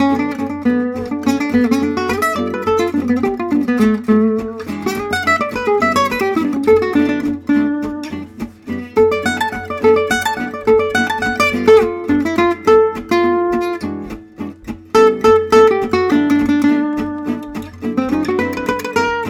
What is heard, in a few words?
Guitars Sample, gypsy guitar